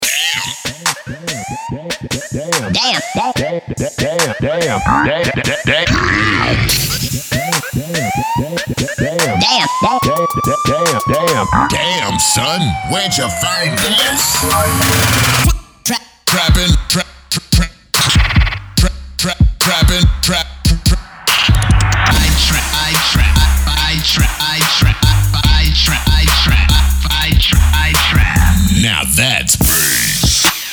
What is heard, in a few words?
trap vocals